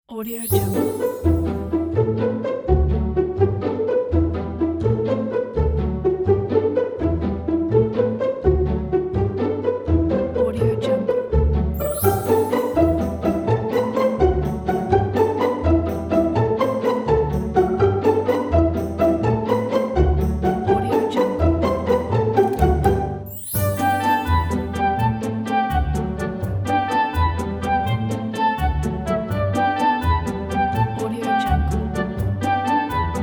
funny comedy cartoon

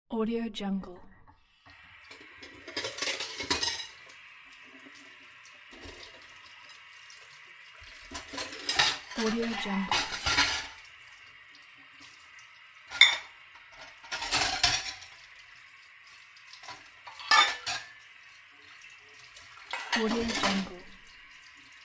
washing dishes on the kitchen

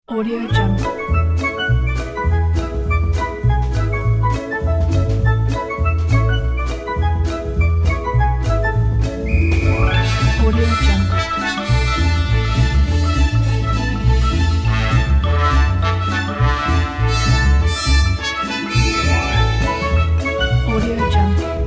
happy children adventure

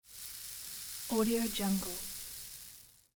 grains falling

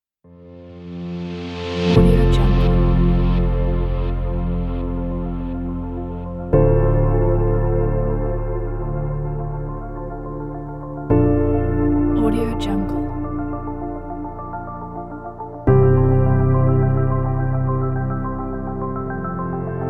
beauty minimalistic background

